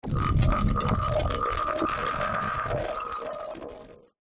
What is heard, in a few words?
Designed Rocks Elements; Gregor Quendel